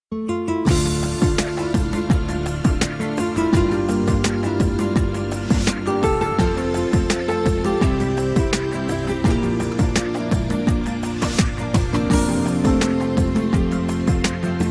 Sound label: Lovers Guitar